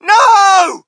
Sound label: crowd, shout; Mike Tyson Heavyweight Boxing